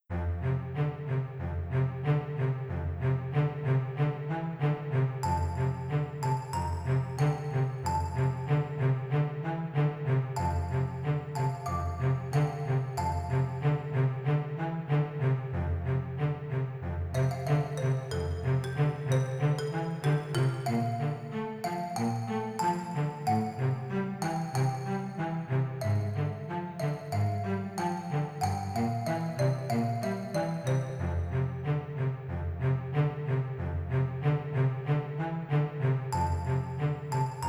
Loop; Tracks Toybox Edition

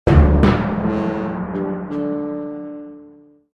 Middle Ages Horns Blowing

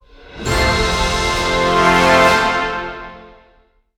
Sting Positive; Aces of the Luftwaffe Squadron

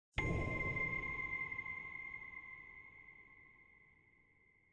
Desert constellation Note C5; Desert SFX

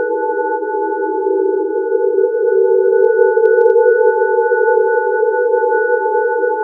Enemy Proximity Drone

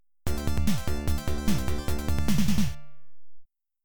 Five Cards Collected, pinball

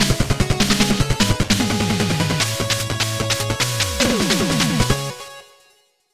Desert Sting

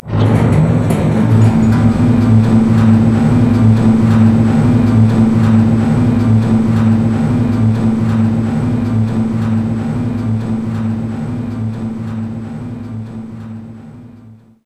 Elevator Loading

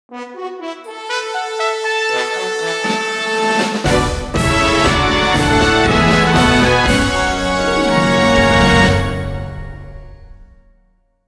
Music event; Jikkyou Powerful Pro Yakyuu Success Special; PS Vita

